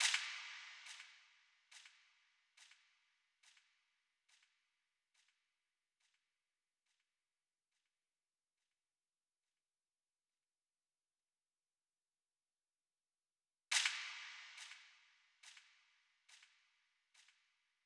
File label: 140 BPM D Min Impact, Drill; Melodies; Loop Stems; Armed